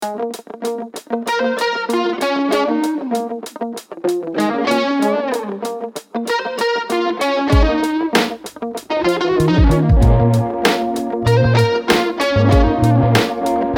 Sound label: Lounge (Funk)